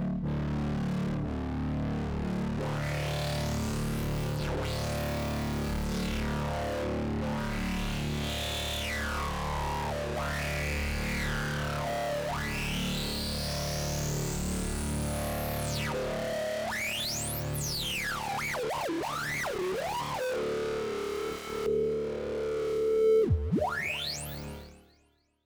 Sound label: Phat Distorted lead